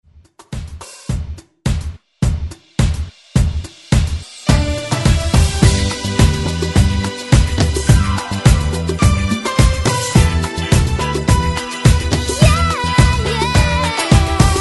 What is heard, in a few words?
20s ReDrum 106Bpm; DJDOLLS